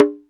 bata

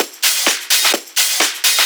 Discohouse Loop 128 BPM

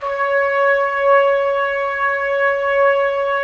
Trumpets; Roland - LA Composer vol. 4

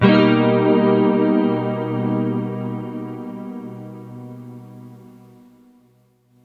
pad; Sonic Foundry (Sony Creative Software); Ugly Remnants VOL-2

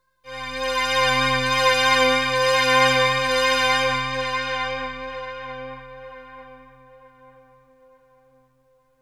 Melody oneshot; ZERO G - Datafile DISC 2

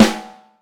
Vintage Snare Drum, 6.5X14

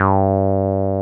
303 OW G3F, 303 OW BS; Drums In Your FACE!

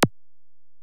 EPerc, Alesis DM-PRO; 300 Drum Machines

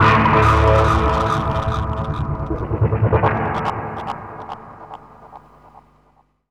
Flying Monks, Musical FX; Zero-G Smoke

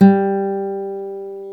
GTR Nylon, Roland

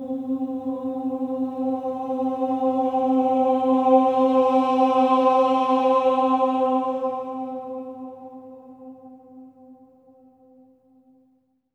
OH C4, Symphony of Voices, SWELL OHS; Spectrasonics

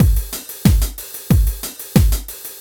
Beat, Hip-Hop

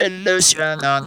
"lele", Funky Vocals; USB Soundscan vol.18; TB MELODY

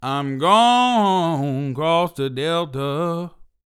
DELTA, BLUES; Blues & Soul; ILIO; Vocal Planet VOL-2

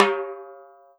TIMBAL, Salsa Kit; E-MU Producer Series Vol. 7 – Old World Instruments

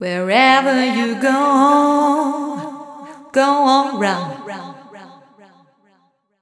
f mel g, fem Vocals

